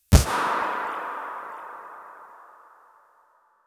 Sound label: logo hitting